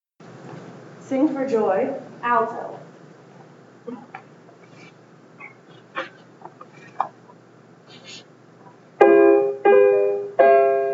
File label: altos